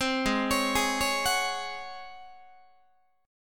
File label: Ab 11th Ab Guitar; Ab11 arpeggiated